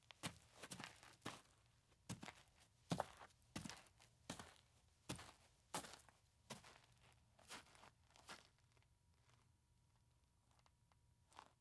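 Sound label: Footsteps On Dirt and Light Gravel; Fairlight Sound Lib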